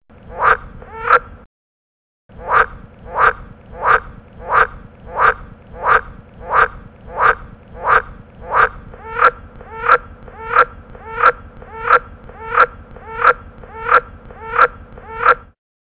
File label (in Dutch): groene kikker territoriumroep